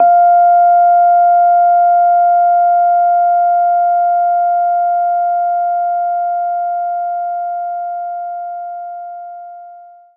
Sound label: Rhodes F4; knights try